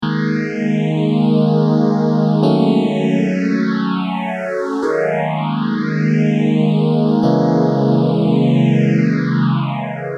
m1000 boss ph3

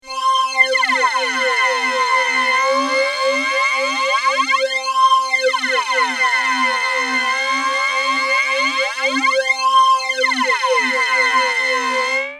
RS09 ADA flanger

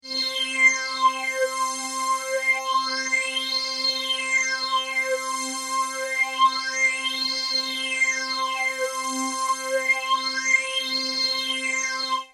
stage phaser

